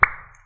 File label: pop; افکت صوتی